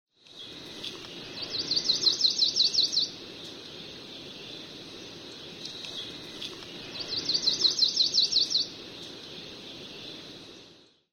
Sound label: oahu amakihi